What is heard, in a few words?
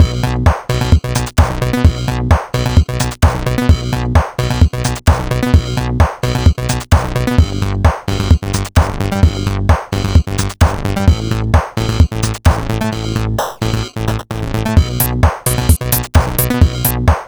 background track; steamcommunity; minigame; towerattack